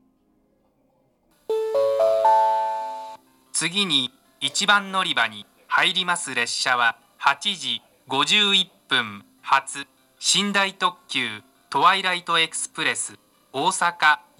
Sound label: kanazawa twilight express; osaka